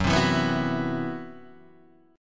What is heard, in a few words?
C Major 7th Flat 5th F sharp